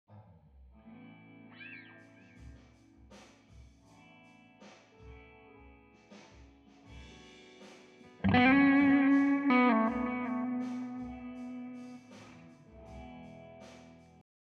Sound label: guit solo and funk, fill